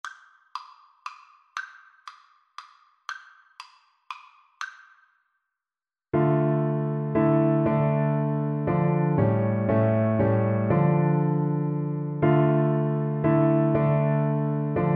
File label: we three kings, Tuba